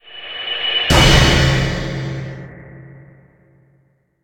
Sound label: ladder start